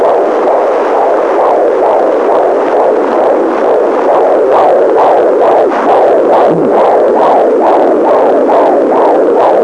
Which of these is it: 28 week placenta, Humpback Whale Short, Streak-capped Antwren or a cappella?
28 week placenta